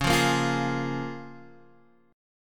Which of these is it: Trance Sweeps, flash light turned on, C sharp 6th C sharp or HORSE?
C sharp 6th C sharp